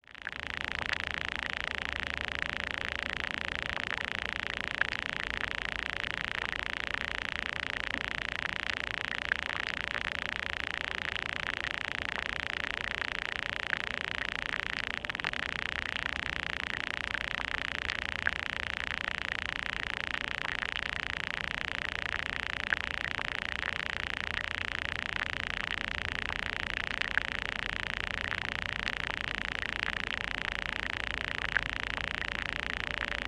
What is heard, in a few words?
hogganfield pond hydrophone recording